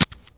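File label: grass; Mafie 1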